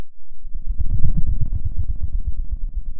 Sound of a dinosaur

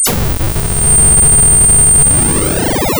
pop

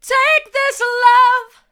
take this love hard, vocal

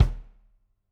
room Kick, drum

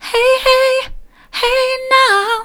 hey now soft, vocal

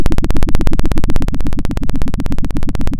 pop, Sound of a helicopter

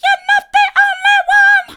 mid, vocal

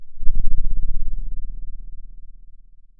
pop, Sound of a cat purring

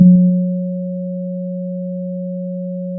Sound of a church bell

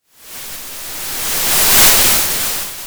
Sound of waves crashing on a shore

